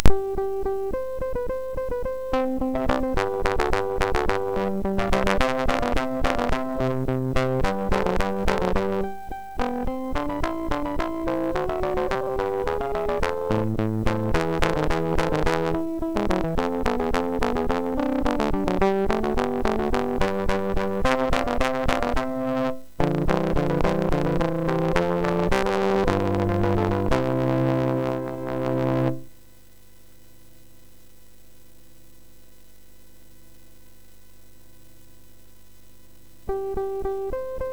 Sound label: Fugue in C, MOS6581R4; sid; hvsc